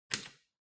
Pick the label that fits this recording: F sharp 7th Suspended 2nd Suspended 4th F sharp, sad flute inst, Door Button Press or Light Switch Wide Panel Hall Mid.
Light Switch Wide Panel Hall Mid